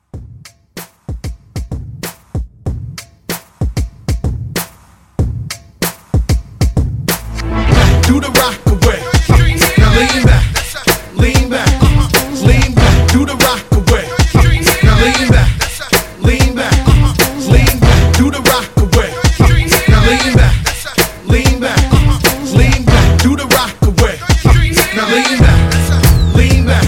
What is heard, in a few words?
Hype Re Drum 95